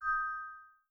spell; angband

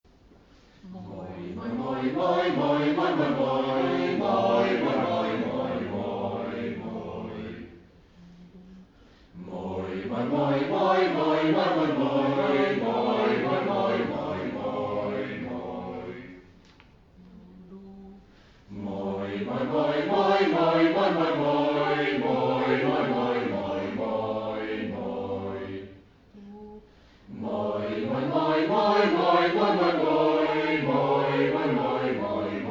practice; performous

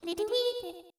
retweeted; mikutter

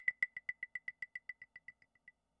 tone clave lo; angband

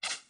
miss arrow; angband

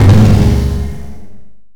explode; redeclipse; weapons; mine

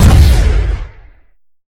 rocket, weapons; redeclipse

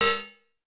teeter; circuslinux

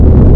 engine; tuxmath

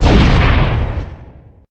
explode; cube2

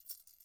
coins shake; angband

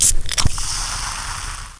juice; njam

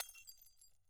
break shatter; angband

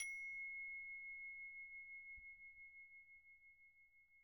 bell sustain; angband

